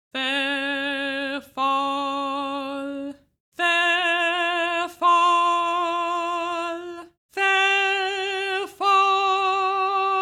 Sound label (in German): verfall 7 toene